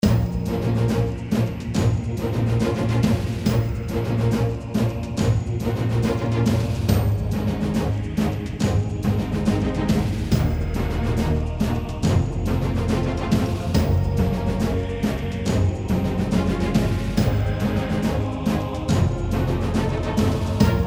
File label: Menu Action